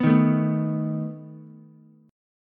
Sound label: A Suspended 2nd Flat 5th A down Ukulele